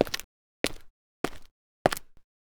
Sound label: footstep